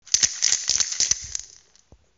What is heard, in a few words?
klak